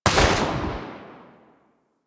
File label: Pistol Shot B; Sybaris